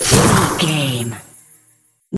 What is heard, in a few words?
ice blast projectile spell